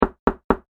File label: Foley Door Knock Solid Door; Objects